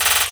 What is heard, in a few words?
jungle roll; Drums In Your FACE!